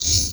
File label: Multimedia Internet CD-Rom Flash Wipe